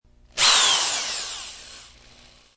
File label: Hand Drill Short, Electric hand drill single rev; Power Tools (Sound Effects:Tools)